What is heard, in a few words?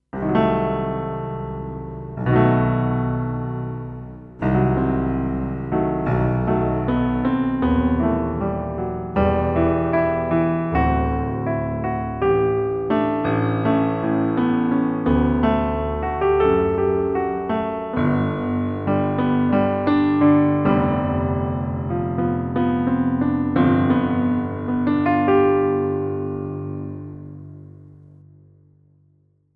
End Theme (Piano); Late Night Radio; museum